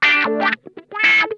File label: THROAT WAH, Funk Guitar; Zero G - Funk Guitar